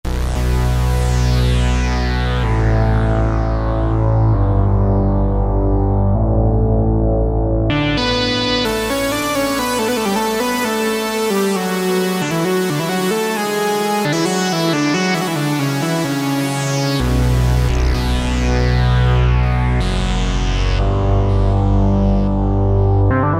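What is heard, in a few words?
Lead New Drone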